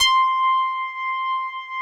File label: PLUCK C6